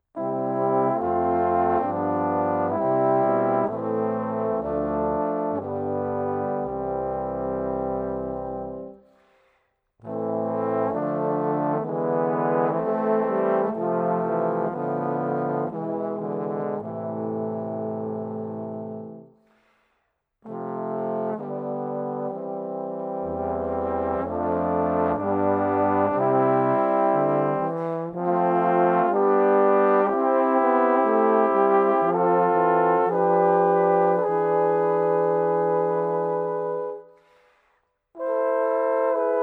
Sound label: Quartet